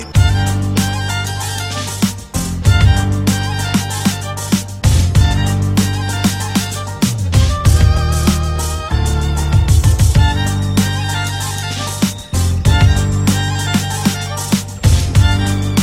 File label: Beauty Violin